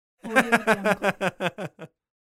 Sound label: male giggle; medusamusiclab